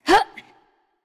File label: Grunt Jump; smite; voicepack; nuwa